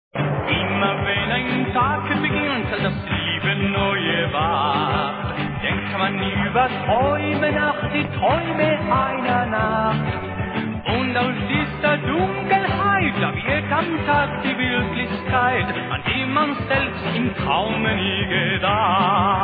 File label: Halleluja